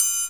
HH HH, Dance

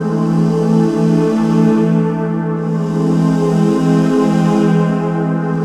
String Loop

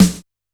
E2 - SD Room H, Yamaha PSR-E323 Room Kit

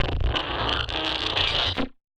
Casio, Glitched, malfunctioning synth

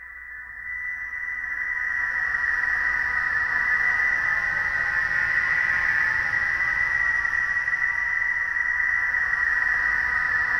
JET WHINE; Distored Reality

